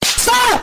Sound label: smack stop